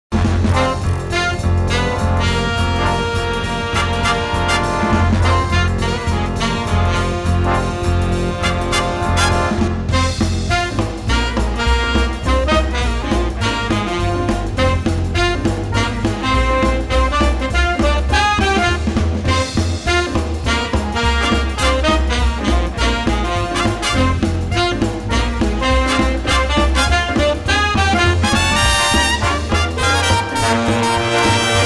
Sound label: big band